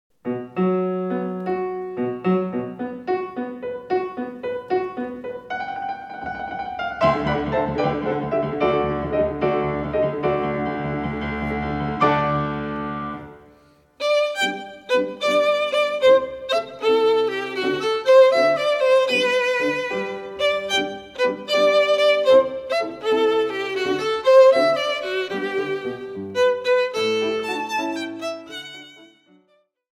Violin Concerto No. 2 in G Major, Op. 13 - III. Allegro moder; Allegro moderato; suzuki violin school